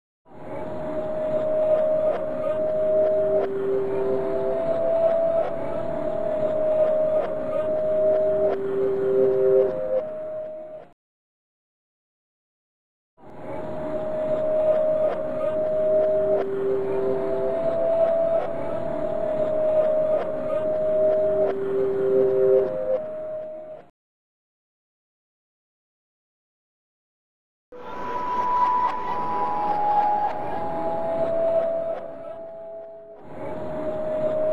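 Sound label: Flute From Toy Piano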